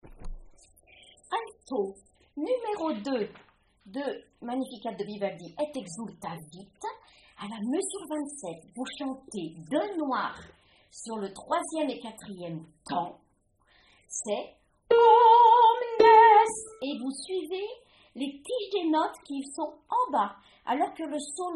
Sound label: Magnificat Alto; Vivaldi